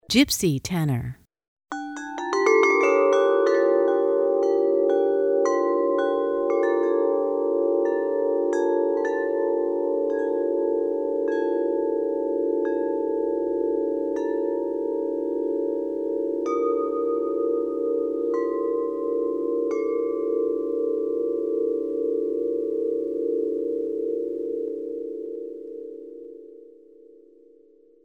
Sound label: Gypsy Tenor